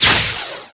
Ovum fire, Weapons; Heretic II Demo

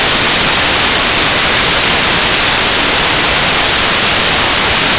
noise white

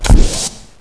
tick

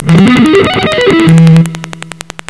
Lead; Sound Factory; Cool Licks

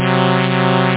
Flange; MarcDrive

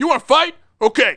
fight; Messiah

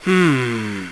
ROB NOISE; NIPPON

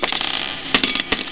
COINS

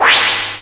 zoom away

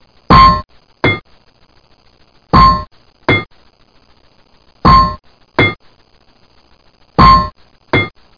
metal hit; effects

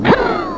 Swipe

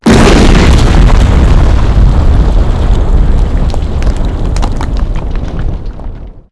explo stone large; Spearhead Demo

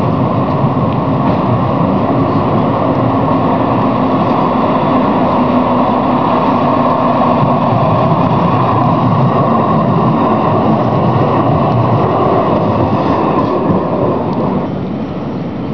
Trolly Drive-by

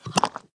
make bricks

